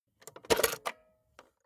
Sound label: cassette player slide tray take out soft